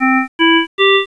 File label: card med, alarms